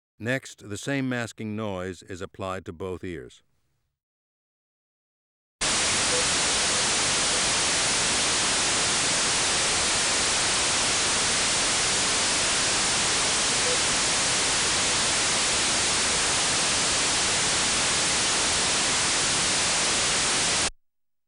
Masking level differences, Auditory Demonstrations